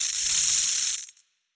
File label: lightning hit electrical sparks, weapons; kaiju; Stellaris; biogenesis